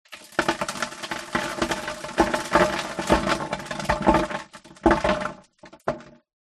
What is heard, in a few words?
foley dirt drop onto plastic box inside pov; zapsplat